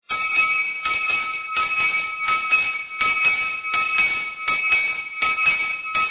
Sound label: Handbell